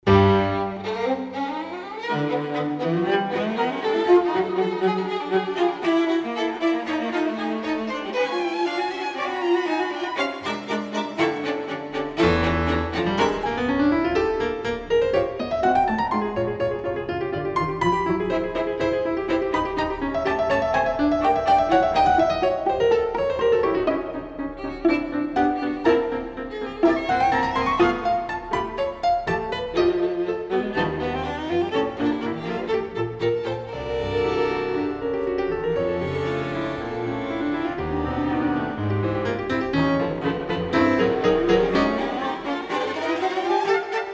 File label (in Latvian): Kvintets Klaviereem Agitato